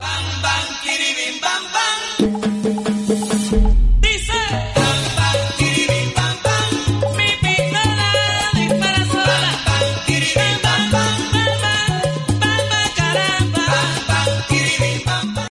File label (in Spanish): salsa; tono de llamada